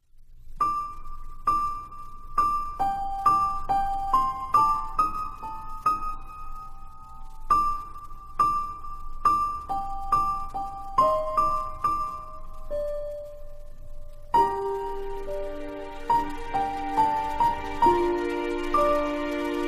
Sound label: Instrumental